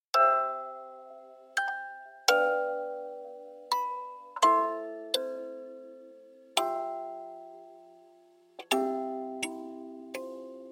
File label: Music Box